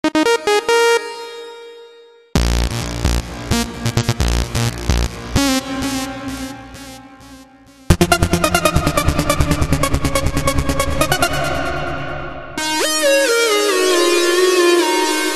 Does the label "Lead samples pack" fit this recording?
Yes